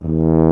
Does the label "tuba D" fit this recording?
Yes